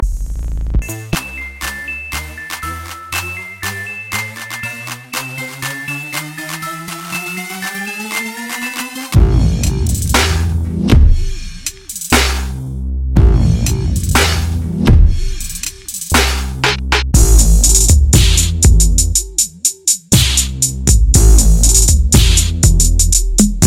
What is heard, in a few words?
Trap